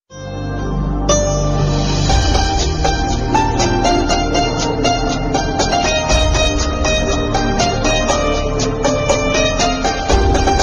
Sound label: guitar ringtones